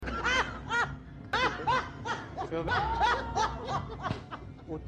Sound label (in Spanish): risa; paty maldonado